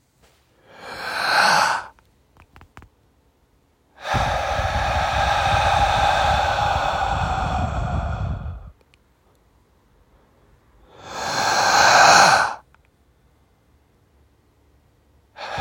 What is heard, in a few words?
inhale exhale